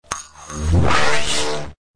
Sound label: alien weapon powerup; Electro and Synthetic